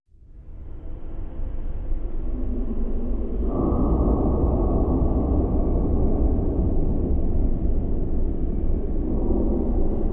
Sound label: Heavy Burden Ambience